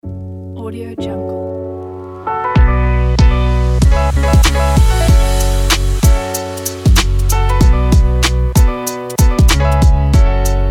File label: summer hiphop